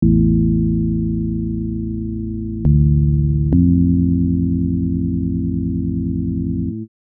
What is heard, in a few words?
synth loop, drum n bass